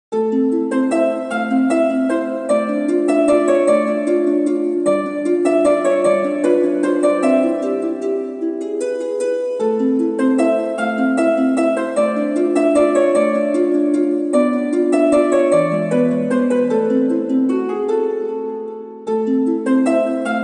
Harp

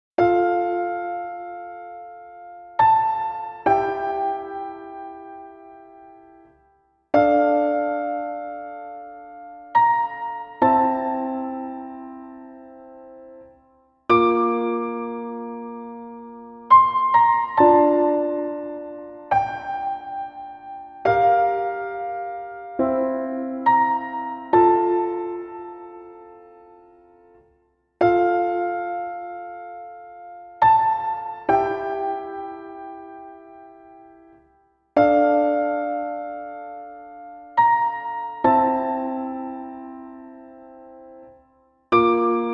Piano

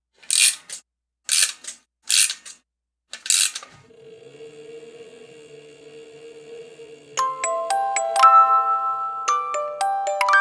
Orgel; gekiban

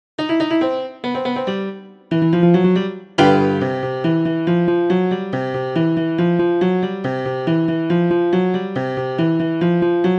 Kids Blues; gekiban

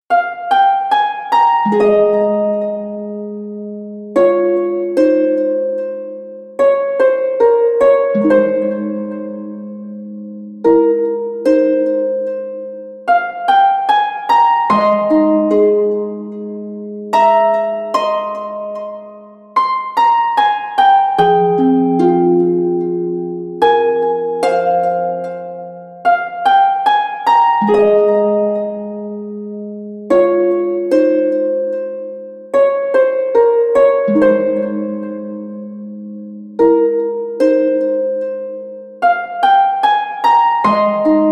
Harp; gekiban

